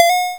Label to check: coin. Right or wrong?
Right